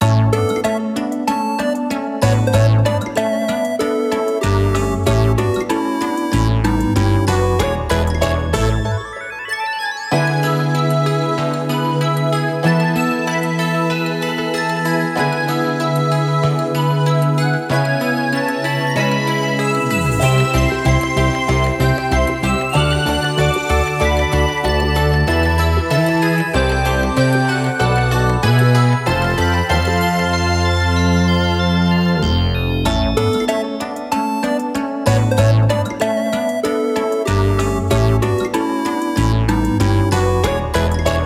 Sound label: BGM Menu Result; Denpa Ningen no RPG Free